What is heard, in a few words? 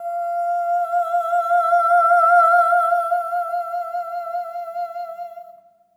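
soprano "oh" F5; Spectrasonics; Symphony of Voices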